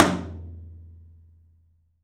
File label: TOM, Studio Toms; 3D Percussives